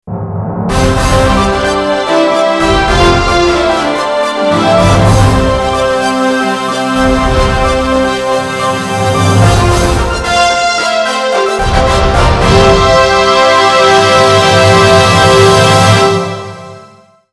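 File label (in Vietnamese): Nhạc mở đầu trao giải thưởng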